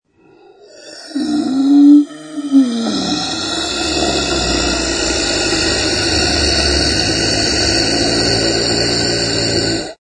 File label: Monster, werewolf, fantasy horror creature or large animal, long drawn o; Monster's Death Bed; Dying or laying down; Monster Sound Effects